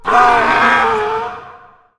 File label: Majestic Stag Play